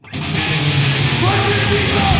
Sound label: reel Spingle Start